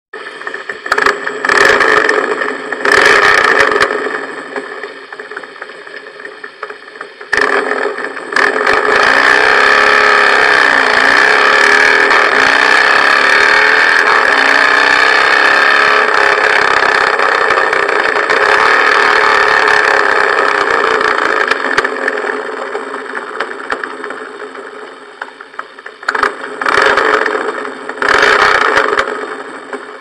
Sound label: Harley Softail